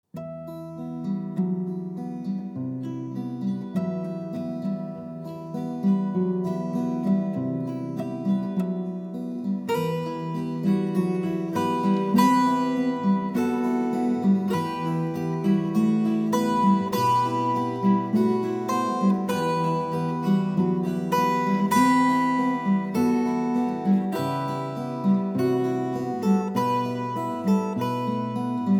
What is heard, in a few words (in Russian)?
Gitara pechalitsya